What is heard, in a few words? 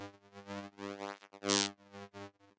accumulator working; factorio 2.0.55